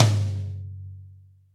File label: high tom one shot G sharp key, Royality free tom sample tuned to the G# note. Loudest frequency: 1162Hz